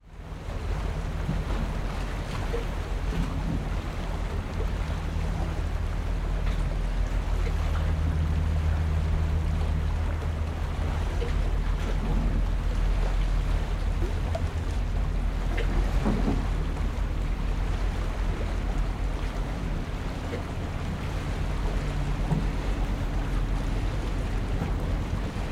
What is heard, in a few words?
transport boat ship ferry pass by choppy sea side of port